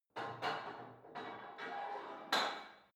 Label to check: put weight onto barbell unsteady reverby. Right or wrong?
Right